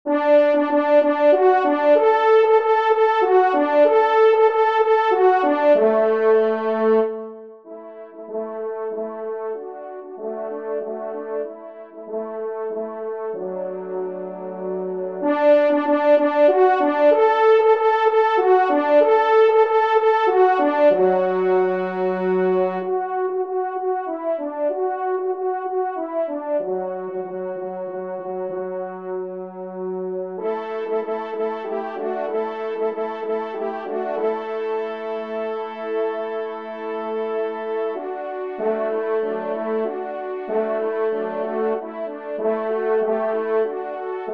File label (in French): TRP; Echos des Forets; 3e Trompe; Charles-Ferdinand Dubois (1849/1899)